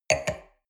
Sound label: wine glass place table soft; berufe; soundpool